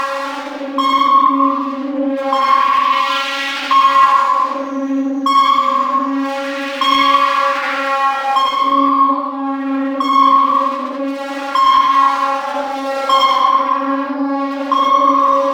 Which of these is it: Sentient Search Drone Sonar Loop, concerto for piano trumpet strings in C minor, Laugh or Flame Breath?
Sentient Search Drone Sonar Loop